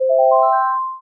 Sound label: level up